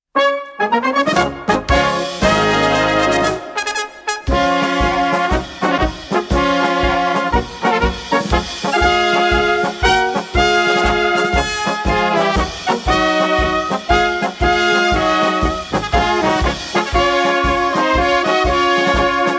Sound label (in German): Polka